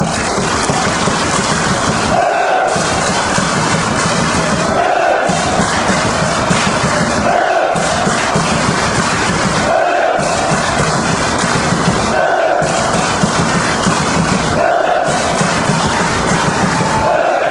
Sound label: drums, fanchants; posnan